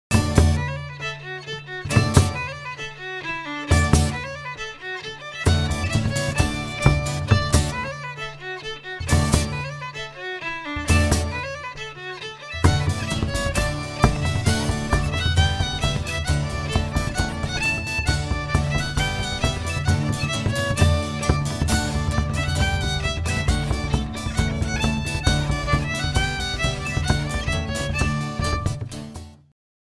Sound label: polka; John ryans polka